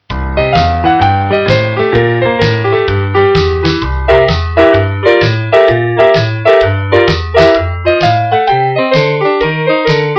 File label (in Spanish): Blues en Do